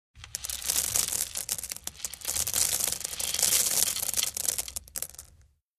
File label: Gravel Pour Shale